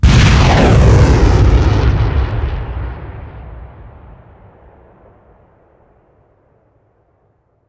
trail, rocket; gmod; arccw uc commons attachments

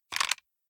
pistol rattle; gmod; arccw uc commons attachments

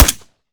fire sup; gmod; arccw uc commons attachments

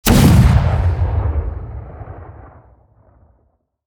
fire dist, rocket; gmod; arccw uc commons attachments

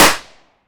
confetti; gmod; arccw uc commons attachments

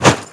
fireaxe heavy; tfa starwars melee weapons pack

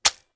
mech, 40mm; gmod; arccw uc commons attachments

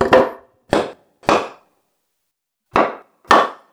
barricade; hammer; melee; tfa starwars melee weapons pack

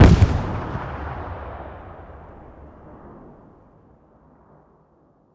med dyn, explosions; gmod; lvs framework

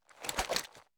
holster

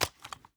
movement smg; gmod; arccw uc commons attachments

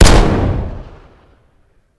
fire dist int pistol heavy; gmod; arccw uc commons attachments

